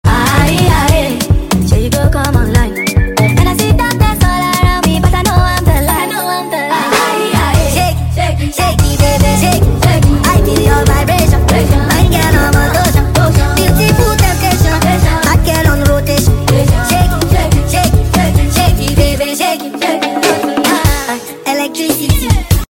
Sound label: Sped Up